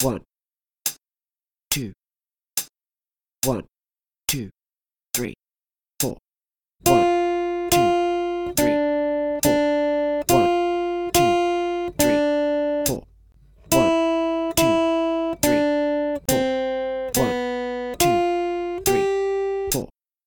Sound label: 3 strings, guitar